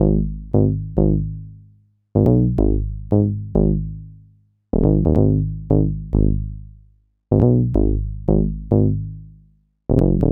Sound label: Bass Loop, 93bpm; Zero-G - Soundclash Nu Dance Electro; Acidized Wavs; Construction Kits; Smooth Circuitry